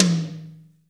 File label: Toms Real